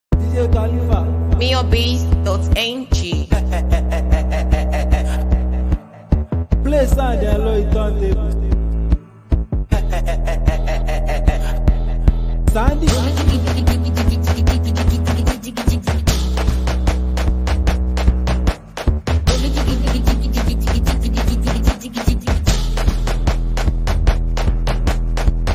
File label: Mara Beat